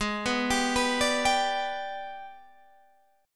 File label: G sharp Minor Major 7th Flat 5th, Guitar Standard; arpeggiated; JGuitar